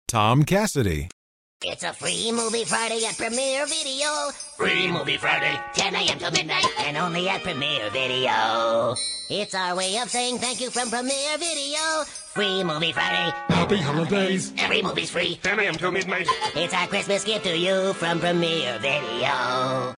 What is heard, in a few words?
ELF SINGING; Elf Elves